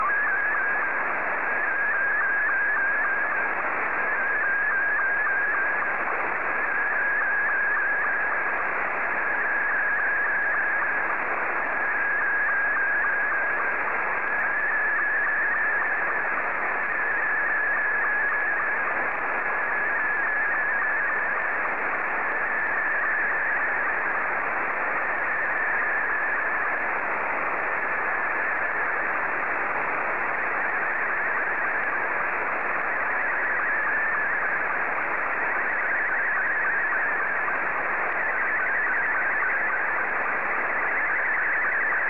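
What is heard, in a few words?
SSTV, Scottie 2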